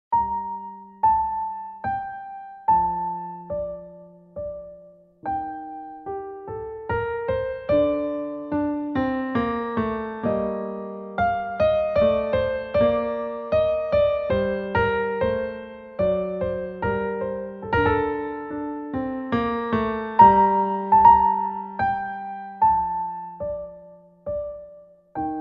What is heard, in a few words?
Bach Minuet G Minor Slow